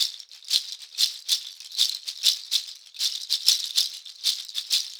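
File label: shaker, Synclavier Percussion, World & Orchestral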